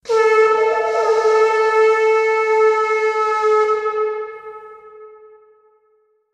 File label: Horn